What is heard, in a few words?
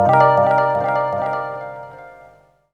Sweet Sunshine Strum; Jungle; Blu Mar Ten - Jungle Jungle - 1989 to 1999 Samplepack